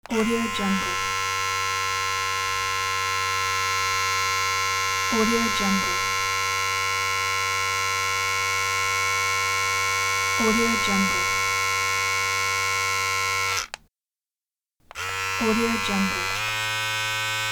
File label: hair clipper